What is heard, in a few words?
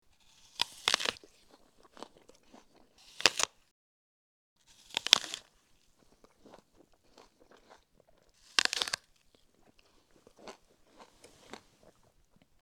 apple bite